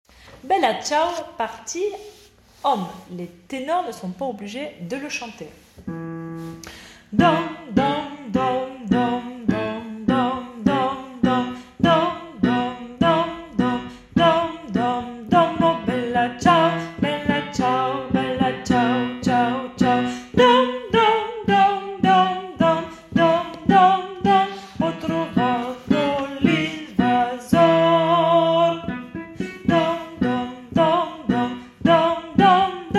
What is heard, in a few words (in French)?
partie basses